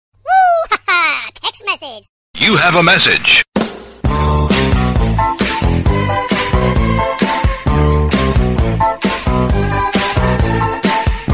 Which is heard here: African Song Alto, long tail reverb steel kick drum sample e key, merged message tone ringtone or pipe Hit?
merged message tone ringtone